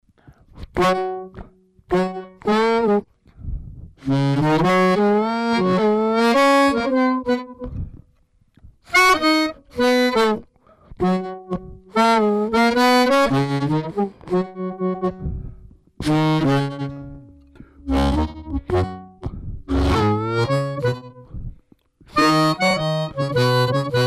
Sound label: oct down 2 oct down 56 percent, bari bass harp sounds